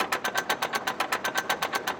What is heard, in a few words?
reel Spin; hiddencoinsofegypt